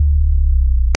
short fail sound about on; negative feedback

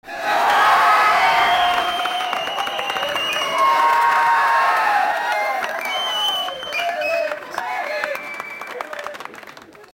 claps by crowd of clowns

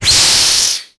venomous snake strike

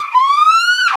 sudden police siren burst

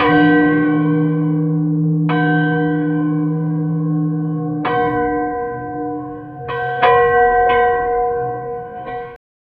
hauntend evil church bell

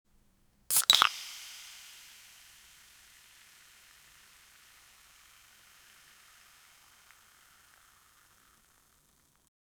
the sound of a beer; beverage

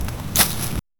relaxing hitting a tree, axe chop

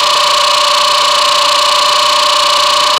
looping sound of a powerf, laser

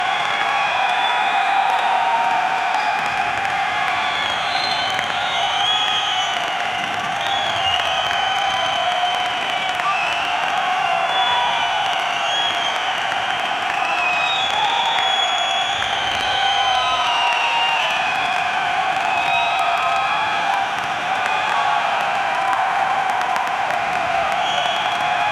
looping ambient cricket s

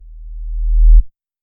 quick satisfying thud

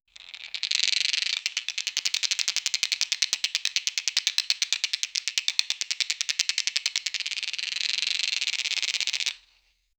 a detailed close up recor, cards

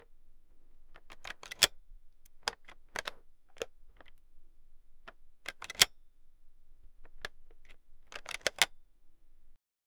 grabbing an old landline, phone

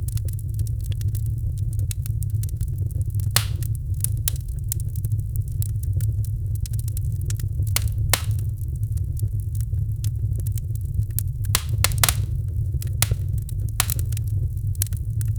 fireplace burning

